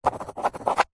office pencil signing signature on back of pad of paper